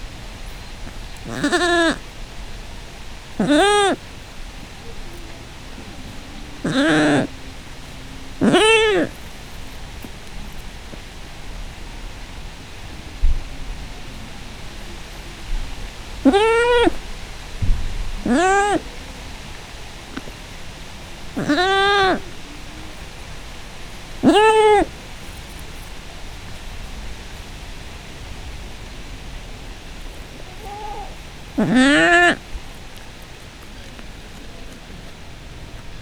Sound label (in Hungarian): gida közelről szél; állathangok; paraszt udvar; házi kecske